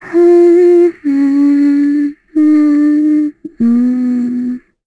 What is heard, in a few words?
Vox Hum; Lavril; kingsraid; voices heroes en